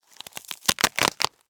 Cardboard, honeycomb, crush, collapse, short; Eiravaein Works; Vaeyan IV

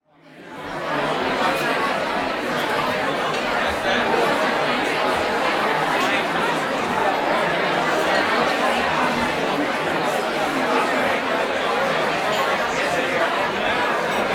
Crowd Indoor Busy Reception; Walla; Sound Ideas; Walla Series

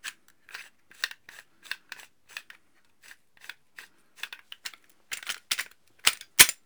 Gun Handling Weapon, Weapons Foley; SFX Kit

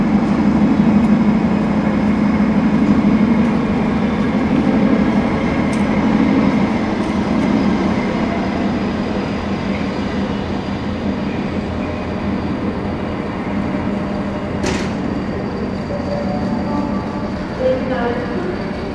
trainstation ambiance; best service Blue Box

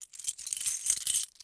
keys grab; DeadFright

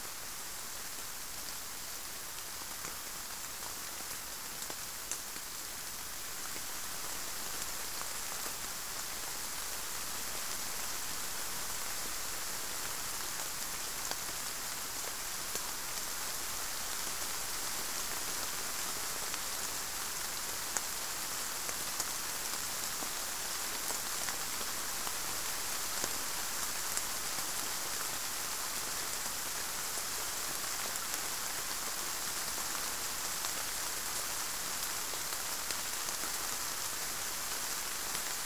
Rain builds subsides sparse; boom library; Thunder And Rain

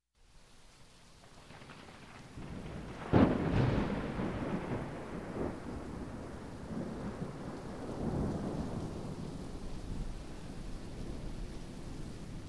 Thunder Roll With Rain In Bldg; Sound Ideas 2000 Series

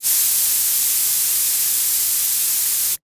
Gas Leak; 1001 Sound Effects

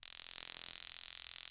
attack; Apotheorasis

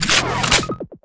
Gun Draw Select Scifi, Weapons Foley; SFX Kit

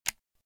Shotgun - Reload; RadwinIsland

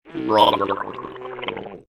drown; RadwinIsland

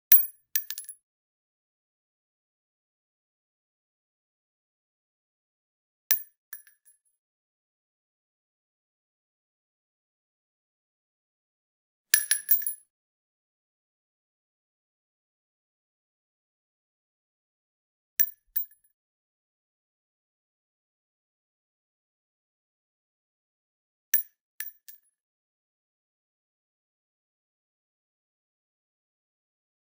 .223 Shell Drop On Concrete; Hollywood Edge; Heavily Armed

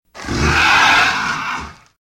Velociraptor - Hurt; RadwinIsland

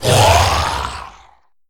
zombie attack; DeadFright

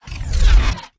screen transition; Space Wave Race

